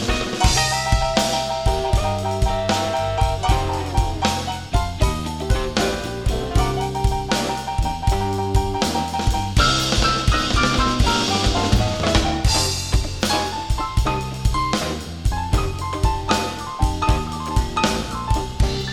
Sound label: Slow Blues 12 8 feel 12 bar Piano Soloing Drum Fill ins